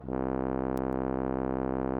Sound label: Instrument